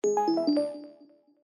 casino lose

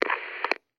loudspeaker start

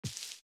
drop

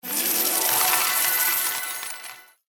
slots jackpot

